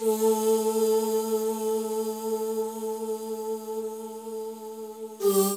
Hip Hop and R&B Drumloops

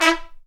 BRS TP, Roland, Stabs; Roland L-CDX-03

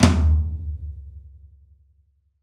Pop Flam Tom, Roland BK-5

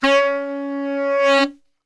C#2 SAX, Brass Super Section; Best Service

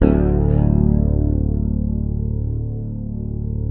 fingered bass D1; Sampleheads; Will Lee Bass Library

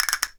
CASTANET; ILIO; Synclavier Percussion 2; World & Orchestral

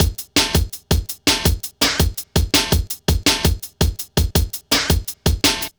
Klanga, drum loops 165.5; Zero-G Jungle Warfare 1, 2 & 3; 90 s Sample CDs

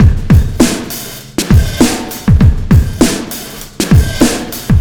Sky Lab Beat, Processed Drumkits

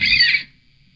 METAL FX; InVision Interactive; Lightware VOL-1; Instruments & Percussions; METAL 5THS+

